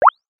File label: NAVIGATE